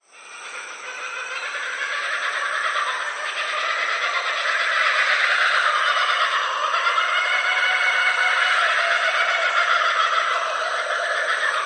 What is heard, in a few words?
laughing kookaburra calls wildlife sounds; wild ambience